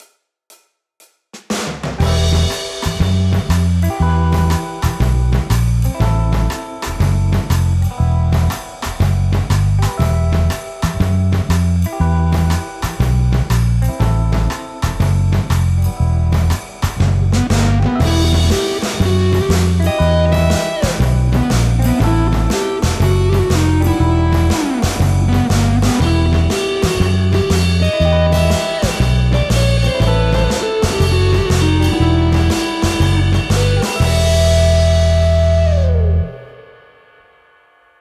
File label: Example Backing; Die erste Übung